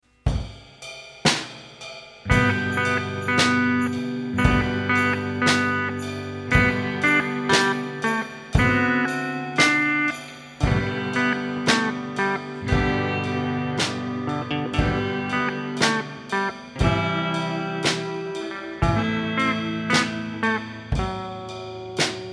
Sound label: Jingle Bells